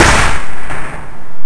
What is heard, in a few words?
Clap; Guitar Rock Tour